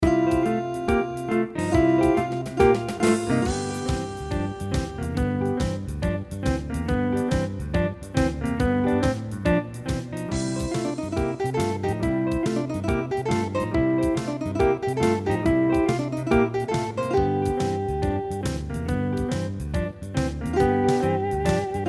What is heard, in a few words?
Blues